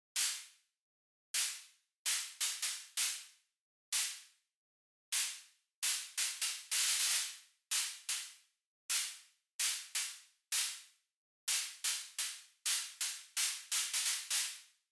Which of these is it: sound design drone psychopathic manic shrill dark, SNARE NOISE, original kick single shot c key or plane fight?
SNARE NOISE